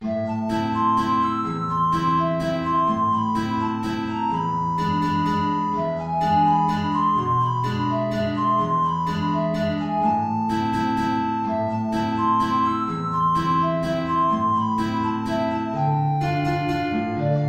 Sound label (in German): Altflöte Gitarre tiefe Notierung